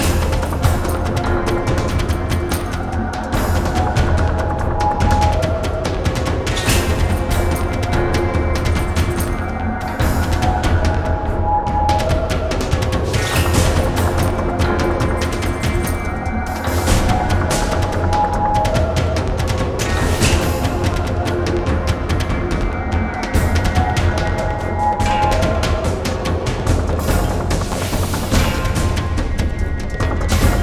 music battle; freelancer-hd-edition

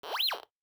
metal detector; minedetector; ACE3; Arma3

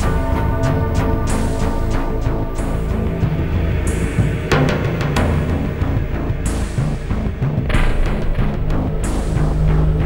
music danger; freelancer-hd-edition

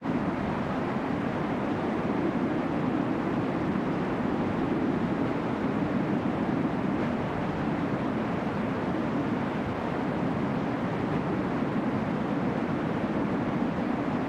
wind, ambient

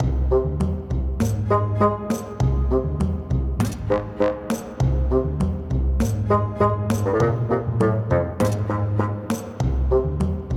music stab comedy

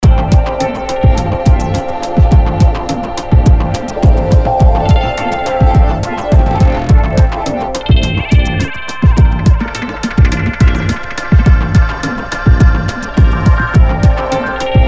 music bar; freelancer-hd-edition

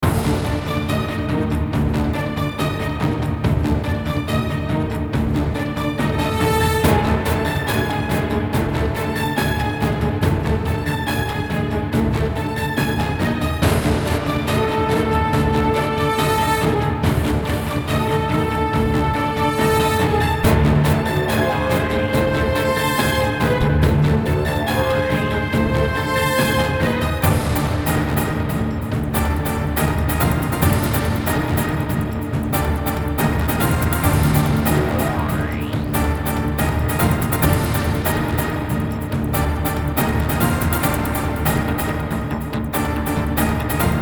music battle; freelancer-hd-edition

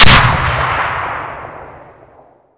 incen exp, grenades; incendiary grenades; Arma3 Epoch; ACE3

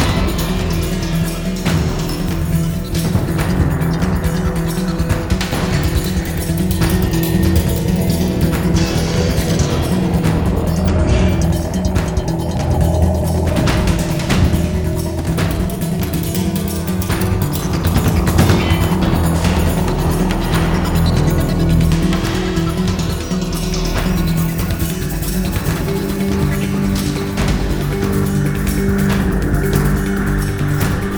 music battle; freelancer-hd-edition; omicron

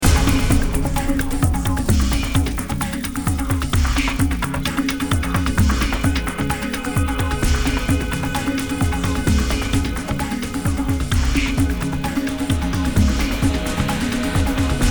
music battle; freelancer-hd-edition; omega

